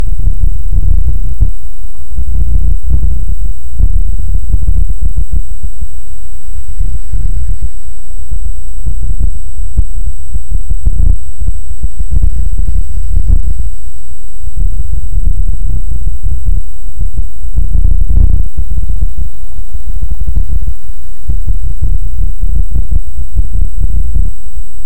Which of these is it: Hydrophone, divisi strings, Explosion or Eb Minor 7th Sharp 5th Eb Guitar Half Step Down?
Hydrophone